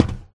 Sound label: footstep metal low walk; SFX; Environment; MONO; Footsteps; ProSoundCollection v1 3